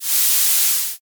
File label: snake hiss